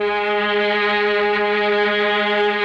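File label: MELLOSTR, Mellotron Violins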